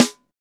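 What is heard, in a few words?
SD, snare drum; 90 s Sample CDs; Sound & Vision - Gigapack I CD 1 (Roland); Real-Kit m 4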